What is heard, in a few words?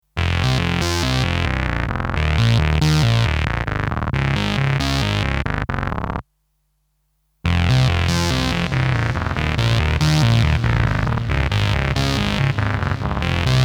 Lexicon PCM70 Single Delay Bass